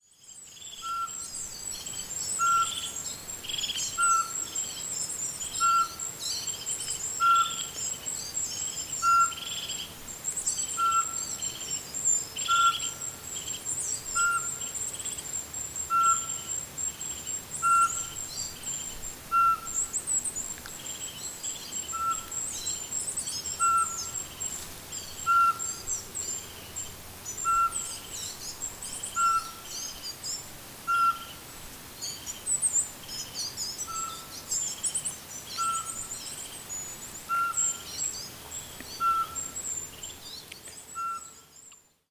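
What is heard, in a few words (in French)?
chevechette, col de porte, chants d'oiseaux; Chouette chevêchette; enregistrés par Jacques Prévost de la LPO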